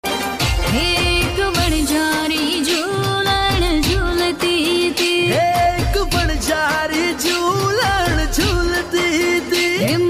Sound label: Navratri Special Gujarati Garba Ringtone; ringtones